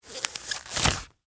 lateksnie perchatki snimayut slajd zvuk

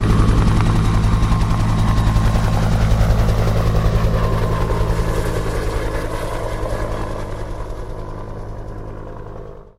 zvuk uletayushego vdal vertoleta

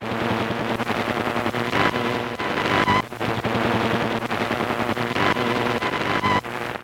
shumovaya petlya 140bpm

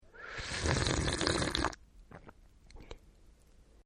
hlebanie burlyashee ostuzhayushee

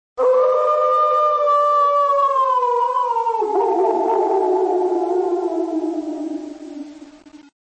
voi odinochnii dolgii v prostranstve s laem

